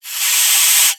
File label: Ocean Steam Blast; Warframe; Grineer Ocean